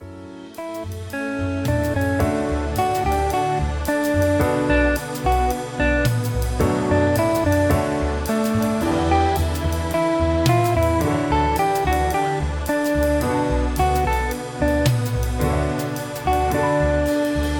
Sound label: Soulful Restaurant Intro